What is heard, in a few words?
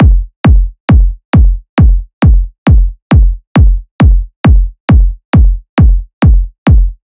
Kick Loop 135, Techno Drums; Ghosthack; Techno Sounds 2025